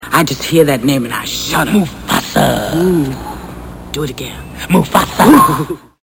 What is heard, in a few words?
lion